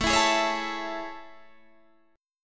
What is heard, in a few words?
A Major 7th A; AM7 strummed